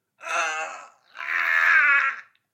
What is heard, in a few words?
Male Pain; SyrinScape; City gone mad